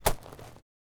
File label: footsteps gravel